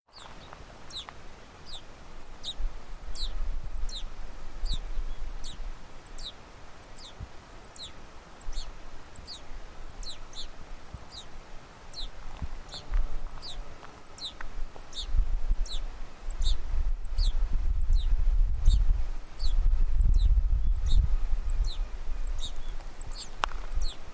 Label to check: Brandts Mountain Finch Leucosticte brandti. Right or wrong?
Right